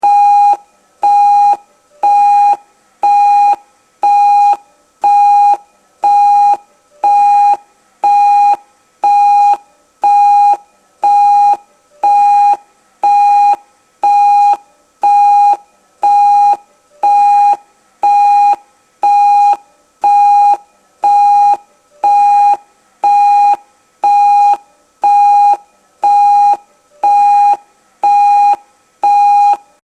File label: Datex General High, alarms, medical